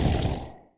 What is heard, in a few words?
mage